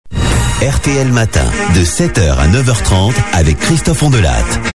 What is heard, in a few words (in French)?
Jingle Matin; RTL